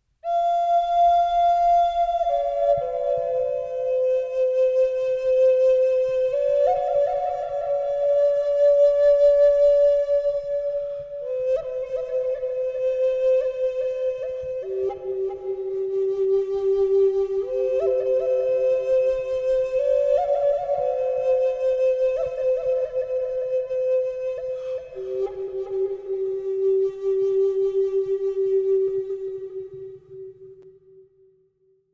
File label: indhian flute